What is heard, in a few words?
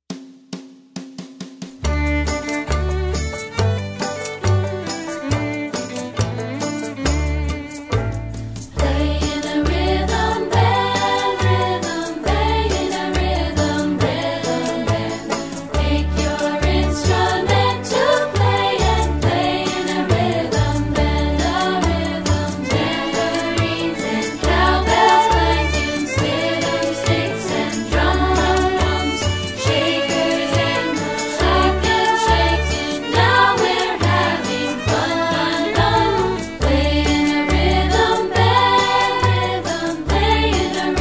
play in a rhythm band full performance